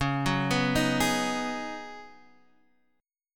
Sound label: Db 7th Suspended 2nd Suspended 4th Db, down, Guitar Half Step Down